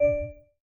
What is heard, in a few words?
Class Harp; Realme 8